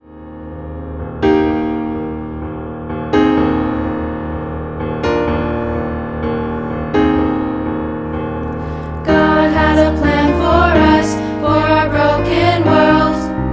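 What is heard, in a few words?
piano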